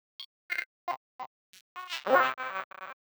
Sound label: Vox; The Weird Side Samples; 99Sounds